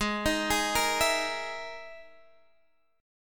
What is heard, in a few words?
E Major 7th Flat 5th chord